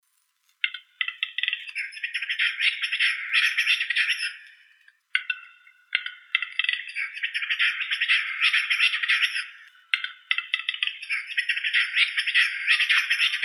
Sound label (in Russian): gluhar tetrao urogallus